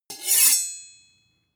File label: sword blade grind ring